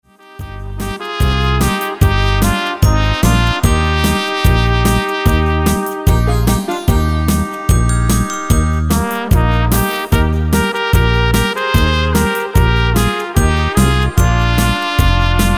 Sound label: Instrumental